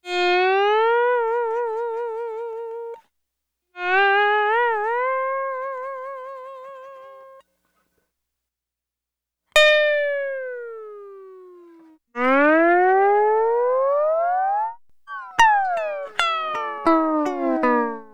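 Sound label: Sunrise Guitar Fx C Dry, Lounge; Zero-G Kocktail Kollection; Construction Kitz (Lounge); Sunrise Mixes 082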